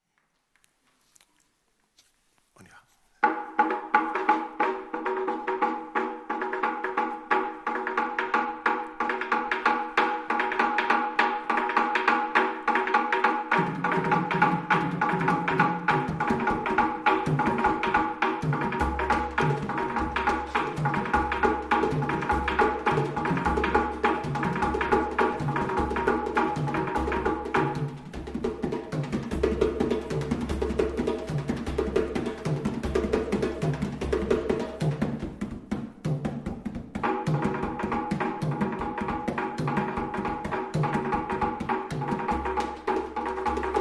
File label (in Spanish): PULSO Y POLIRRITMIA; musica; africa inspira a occidente